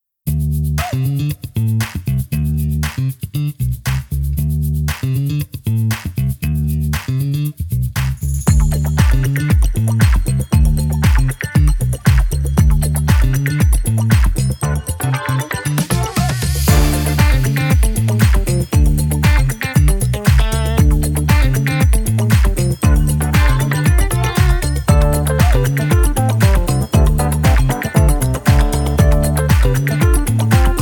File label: Funk Time